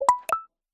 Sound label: nokia ringtone; nokia rhea